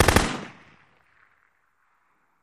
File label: Machine Gun Single Rapid Fire Burst